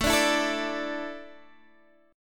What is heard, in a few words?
A Suspended 4th down Guitar Standard; Asus4; strummed; JGuitar